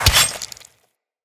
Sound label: Melee Sword Impact Flesh